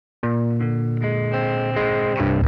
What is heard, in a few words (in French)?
guitare claire